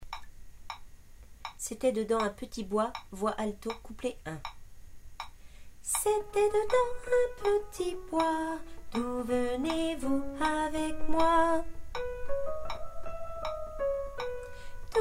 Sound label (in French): alto